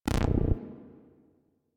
Corrupted Notes E; Warframe; Bard Quest; Bard Boss Fight

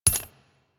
Flak Cannon Shell Impact; Warframe; UT Flak Cannon

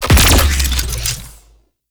Shot Heavy; Warframe; Weapons; Archwing; Larkspur Prime

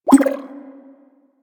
Blessing Thank You Bubble, UI; Warframe; Blessing Device Menu

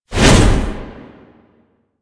Hammer Whoosh; Warframe; Veilbreaker; Sprag

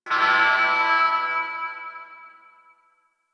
Puzzle Switch Alarm; Missions; Raid

